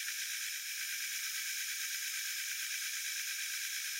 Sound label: reels rotate second loop; Wazdan